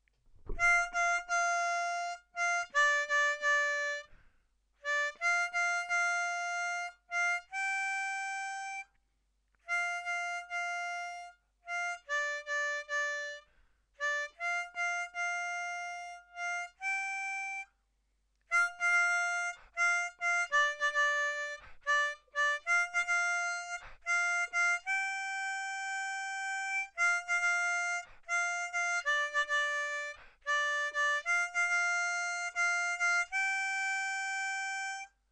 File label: Verse lead